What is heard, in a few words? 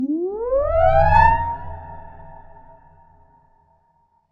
One Ring Run